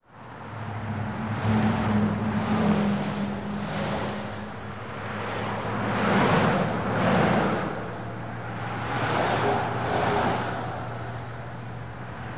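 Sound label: cars, traffic jams and car traffic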